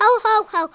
ho ho ho

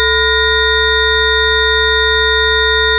Tone

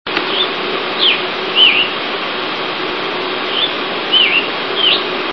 tanager scarlet; birdsongs

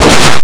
rocket launch

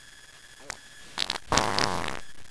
fart

